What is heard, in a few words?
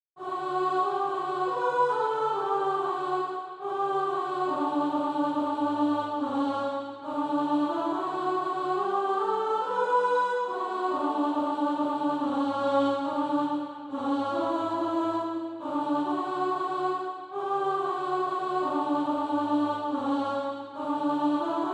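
alto, SATB Practise Music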